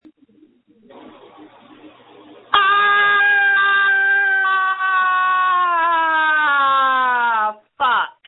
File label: screams